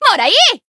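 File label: Voice attack, Japanese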